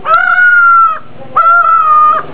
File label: sumatran ground cuckoo